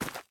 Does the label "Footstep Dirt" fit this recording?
Yes